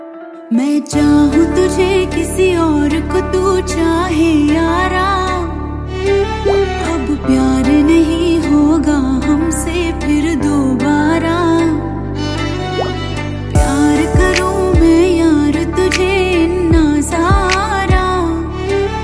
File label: Song Female Ringtone